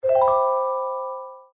multiplier increment; Farm Mania; Happy Farm

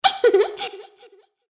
moan; Imperial Girls

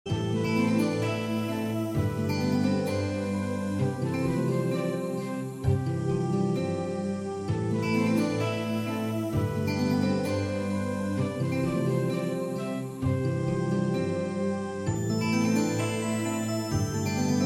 free games idle; Great Book Of Magic; Wazdan

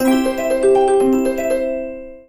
collect freeround; Grand Fruits; slot game

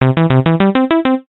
WIN; All American Poker

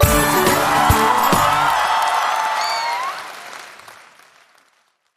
multiplier win; Racetrack Riches; megaboard

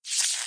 buff thunder; Thunder Fighter

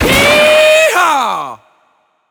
bigwin

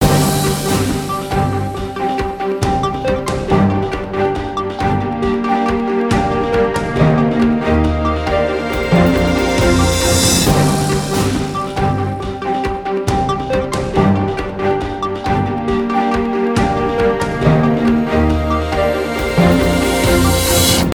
free spin melody; La Gran Aventura

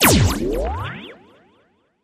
bigwin; Magic Stars 5; wazdan

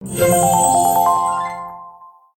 win; Beauty Fairy

